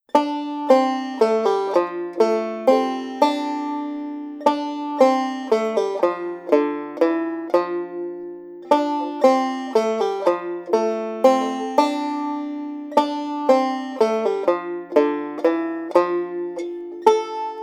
Melody; Cumberland Gap

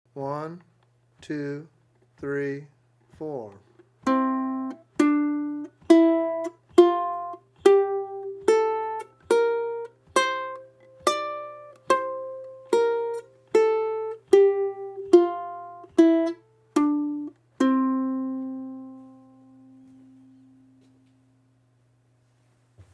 F Scale

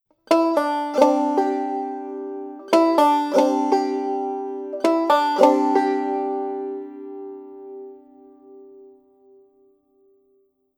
Pull Off

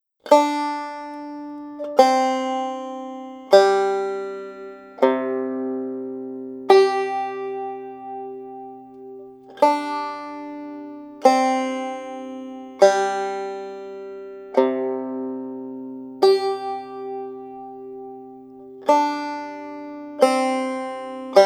Tuning

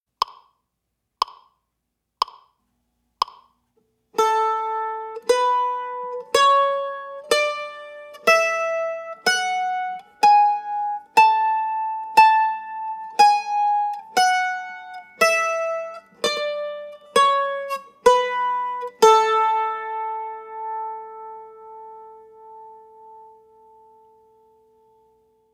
A scale, mandolin

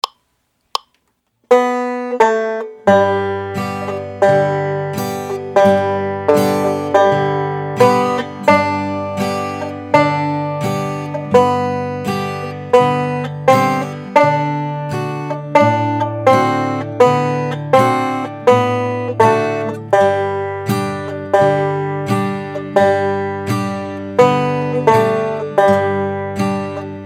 Blues; Columbus Stockade

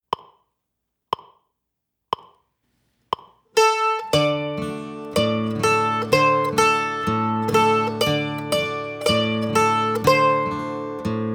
Working on a Building, Mandolin

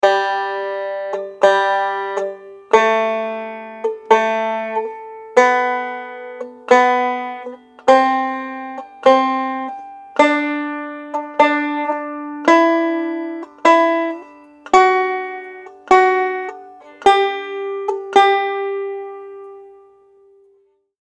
G Scale on One String

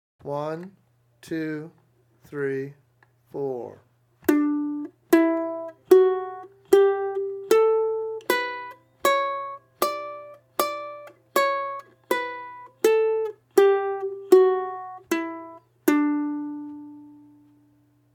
D Scale

